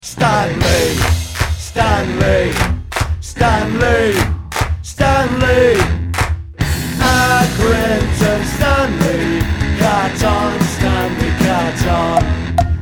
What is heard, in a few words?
Chant